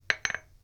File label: Glass Put Down